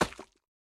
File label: Slime Impact Large